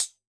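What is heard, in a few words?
Closed Hat; drum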